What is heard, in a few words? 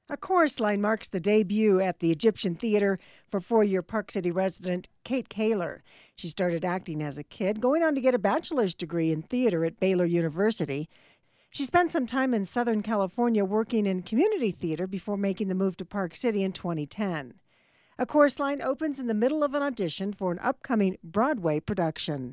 chorus